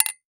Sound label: Impact Metal Object Solid Hit By Small Metal Pipe